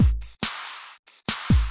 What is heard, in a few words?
140 bpm keep pushing, drum loops and breaks